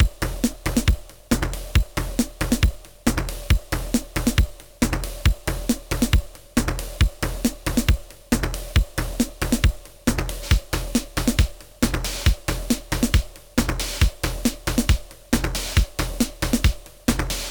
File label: Beat using individual pitched sounds 2, Tr 505 bend